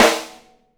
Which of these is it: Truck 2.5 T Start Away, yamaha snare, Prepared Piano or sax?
yamaha snare